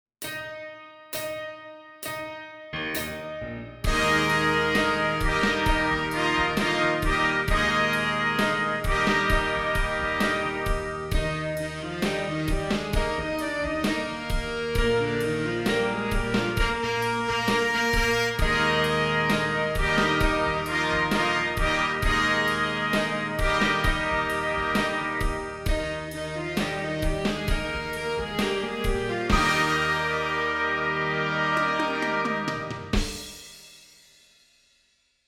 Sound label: Exercise